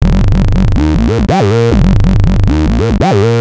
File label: Analoop, Industrial Analog